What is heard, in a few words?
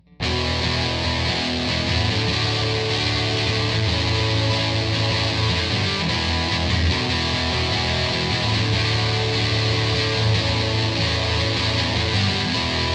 electric